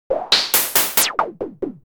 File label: game fx wobble; smartsoundfx